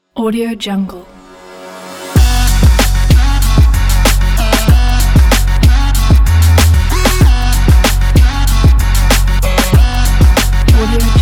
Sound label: short powerful trap beat